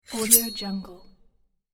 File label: knife draw sound